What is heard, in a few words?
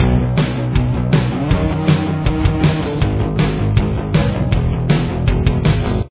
chorus, guitar